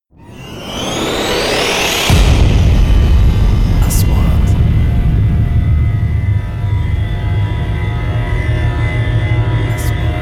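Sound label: 3D SFX Trailer; مؤثرات تشويقية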